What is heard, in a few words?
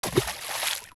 Fishing Rod Splash; Fishing lure splashing in water